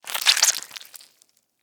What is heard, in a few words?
Surgery Incision; Medical; Blastwave